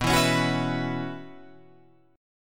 B Suspended 2nd Suspended 4th B Guitar Open F, chord; Bsus2sus4 strummed; JGuitar